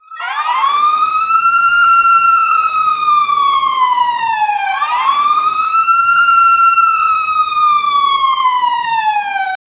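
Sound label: sirens partially submerged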